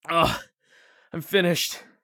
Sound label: hurt neardeath; Escape from Tarkov